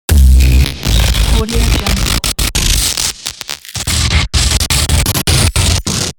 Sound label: hard glitch